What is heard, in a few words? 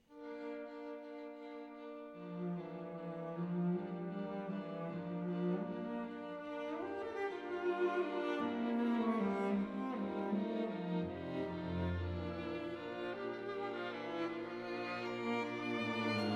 String Quartet in G minor; No. 1 op. 27 - Romanze. Andantino